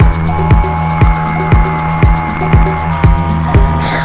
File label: dj loop